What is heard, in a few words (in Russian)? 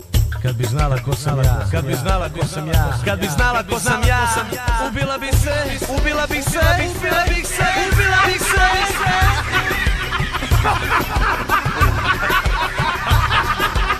Smeh